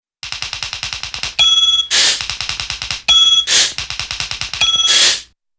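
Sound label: Funny Typewriter with rapid Key Strokes Bell Dings, cartoon; Carria; Hollywood Edge; Cartoon Trax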